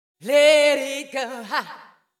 VOICE GRL HOUSE 125 A, House Voice

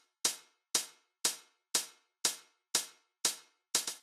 hihat; reaper

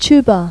TUBA; Pipo-Aprende musica con Pipo

